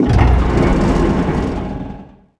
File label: tank frames